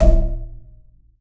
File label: BOW PERC, BASS FINGER; Xsample VOL-5